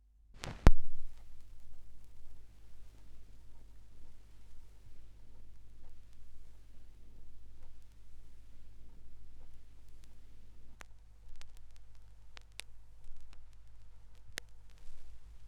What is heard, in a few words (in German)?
Schallplattengeraeusche; rauschpartikel